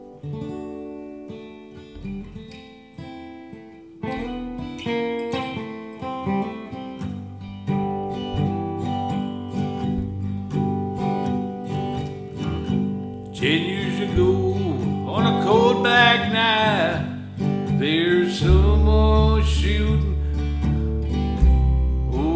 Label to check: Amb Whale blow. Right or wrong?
Wrong